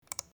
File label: click